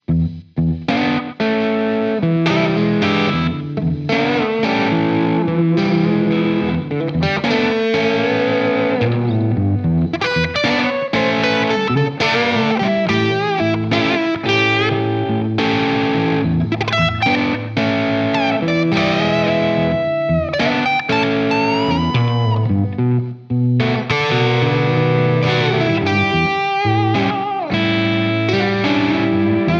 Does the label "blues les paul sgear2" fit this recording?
Yes